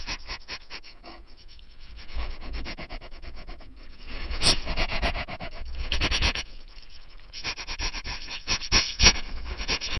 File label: realistic soun, pet sounds